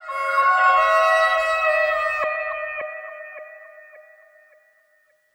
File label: VIOLINS FX; USB Soundscan vol.60; Asian Traditions; AKAI; VIOLON FX